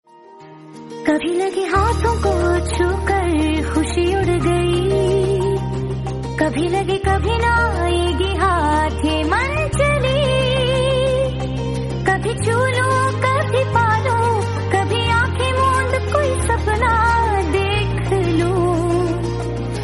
bollywood song tone ringtone

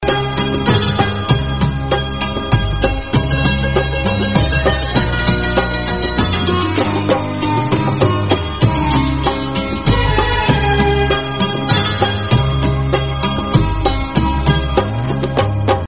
instrumental

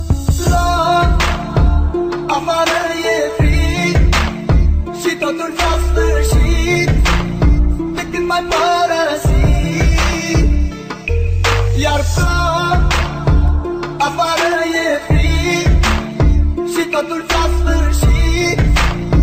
arabic ringtone, islamic

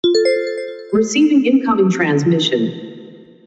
incoming transmission sms ringtone

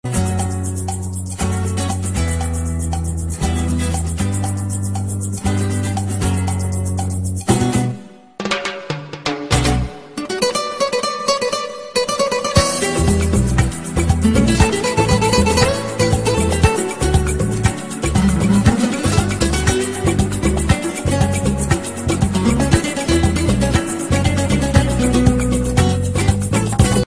wonderful spanish guitar ringtone

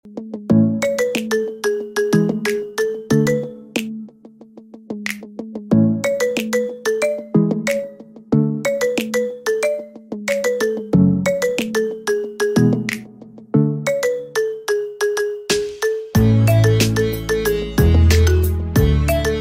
remix ringtone

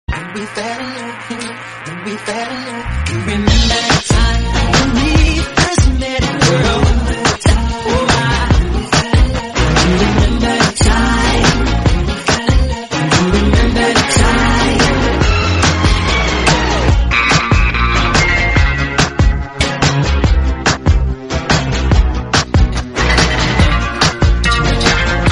hip hop song tone ringtone

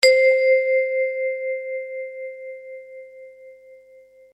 ring of bell ringtone

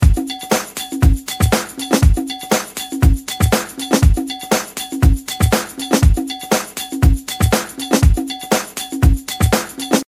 disco drum rhythm ringtone

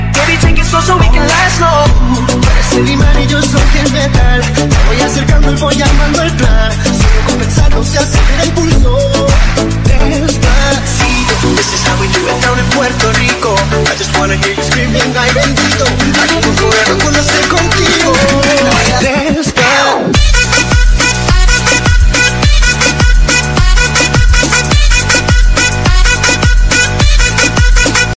english song ringtone

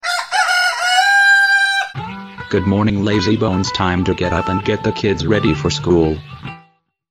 morning rooster ringtone

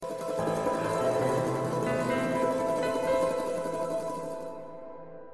calme mobile ringtone

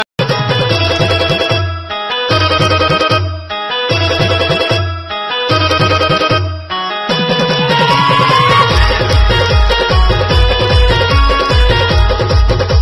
funny music ringtone

